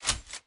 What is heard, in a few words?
Spin